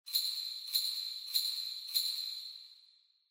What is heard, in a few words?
Ring Bells Reverb Phrase